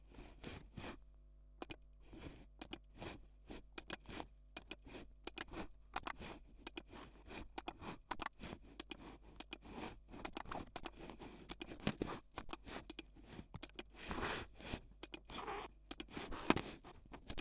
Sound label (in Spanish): raton y clicks